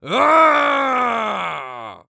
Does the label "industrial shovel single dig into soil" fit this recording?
No